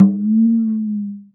GLISSENDO; Univers Sons - Raricussions

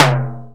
SDX ROTO, TOMS; Greytsounds VOL-1

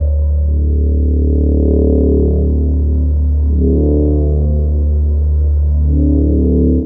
Space Bass; Sonic Foundry (Sony Creative Software); Knutrix Drum & Bass

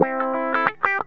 GTR, Funky; Zero-G; Funky Elements

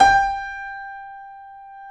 KEY G 4, YC7 Piano mf; Roland L-CD701

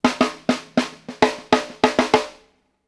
furious; Sampleheads; New York City Drumworks VOL-1; KD FILLS 84

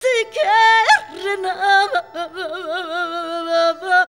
VOC, Gipsy

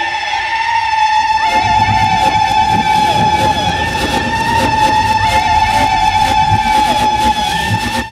Kaos FX, Ambient Grooves

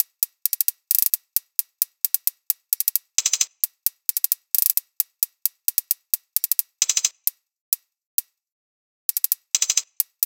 loop hat 132; Seismic Loops

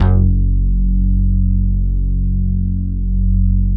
WAVE F#2; Masterbits - Tekkno Therapy

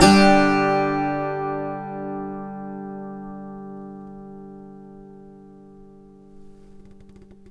MAJ G UP, Blues Guitar; ILIO; Fretworks

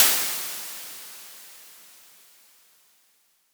Crashes; LIMAT Div Dept; Drums

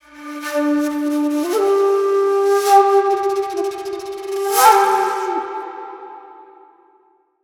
Shaku, Wind Instruments; Sonic Foundry (Sony Creative Software); World Pop

